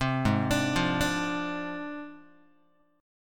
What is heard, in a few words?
G sharp 6th Flat 5th G sharp Guitar; arpeggiated; JGuitar